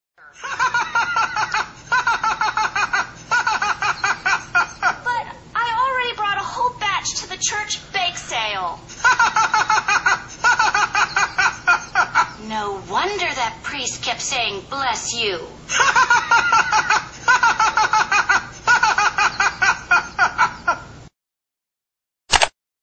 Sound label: annoying laugh; family guy stewie